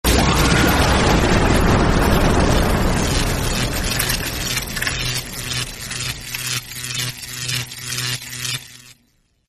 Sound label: lightning impact